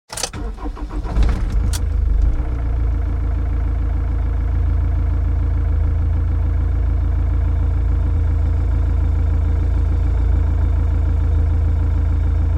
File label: Truck Idle Interior; noise creations